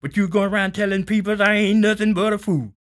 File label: SING, Blues Soul; Spectrasonics Vocal Planet